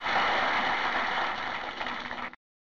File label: Applause; Wheel of Fortune Deluxe Edition 1993 snes